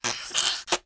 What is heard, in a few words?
cartoon squelch splat